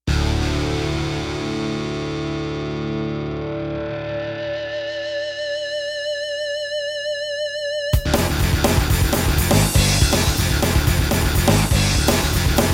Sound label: Roland SC-8850; Doom Revisited